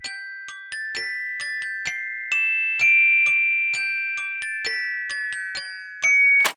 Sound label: Jack in the Box Music Box; Silent Hill Downpour